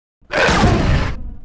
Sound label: attack, Mob